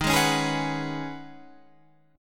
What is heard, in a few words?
C sharp 7th Suspended 2nd Suspended 4th D sharp